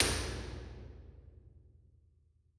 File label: Chappel, Impulse Responses; Real Places; Ableton Live 12 Lite; Hybrid